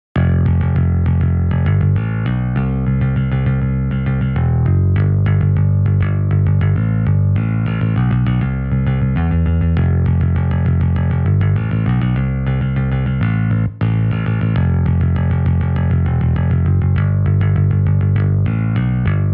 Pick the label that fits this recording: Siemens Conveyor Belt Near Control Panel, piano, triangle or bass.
bass